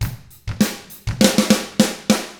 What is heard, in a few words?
ROCK, Drums; ILIO; Double Platinum Drums 1